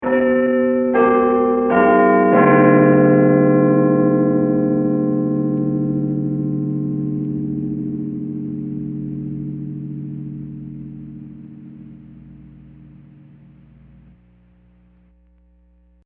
Big Ben Quarter Hour; Bell Sound Effects; Musical

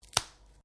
Branches Dead Snap

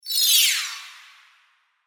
Magical Fly By, Small, Fast; BlastWave

